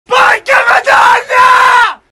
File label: failsound; StorkMoon